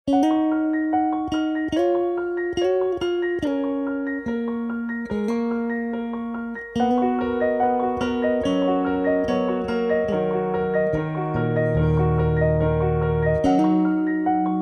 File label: Music Box